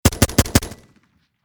Warfare Machine Gun Hkg36 Four Shots Close Perspective; Heckler Koch